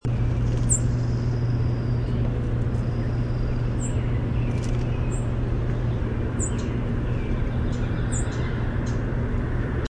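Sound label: Golden-crowned Sparrow call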